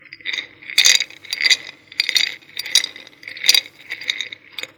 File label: Candy Jar